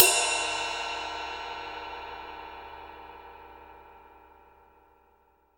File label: RIDE, Drum Dry; East Collexion; Drum 1 Dry